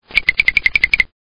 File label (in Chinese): 卡通脚步声; 走路跑步